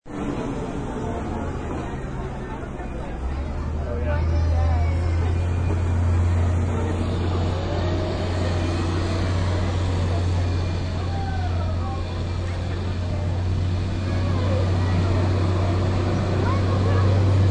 Shttl Bus, Shuttle bus drives past with crowd sounds.; Busses (Sound Effects:Vehicles)